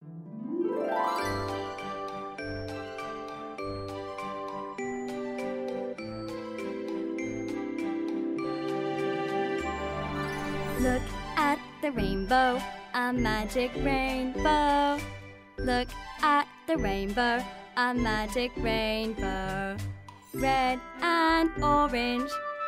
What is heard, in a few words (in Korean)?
영어 여 여아 노래